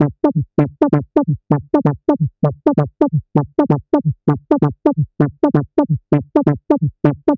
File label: NRG Bass, Trance